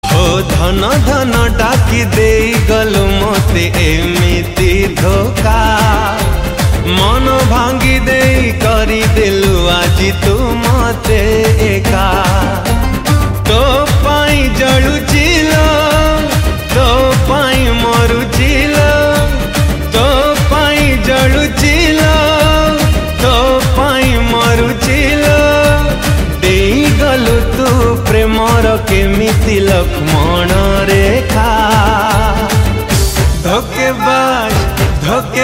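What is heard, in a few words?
Sad Song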